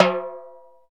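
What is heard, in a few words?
PERCUSSION HI TIMBALE, Latin; Roland L-CD701; PRC Latin 3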